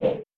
Whow; Super Smash Bros

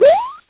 Jump Off Fence; New Super Mario Bros. Wii

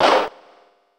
Egg Throw Pop; Yoshi; Super Smash Bros

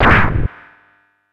Raygun; Super Smash Bros

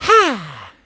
sigh; super mario sunshine

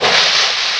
slide; mario 64

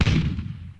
Bobomb Cannon; New Super Mario Bros. Wii

